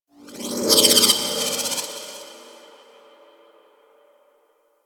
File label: Sculpture Movement Legs; Mountain Pass; Warframe; Ambience; The War Within